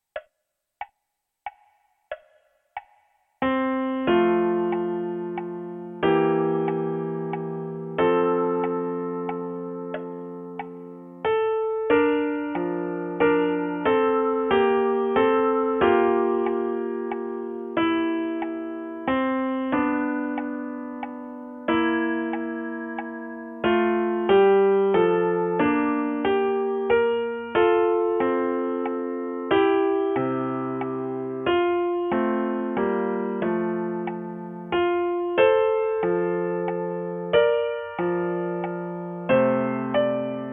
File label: piano